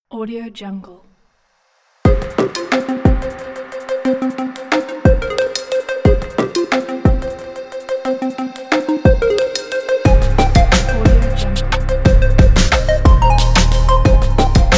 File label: trap intro logo